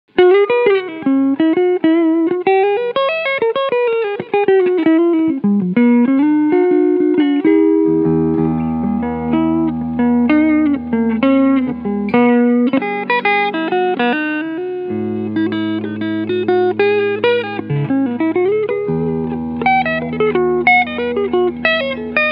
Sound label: Harley Benton HB-35 Plus Cleansound Neck Pickup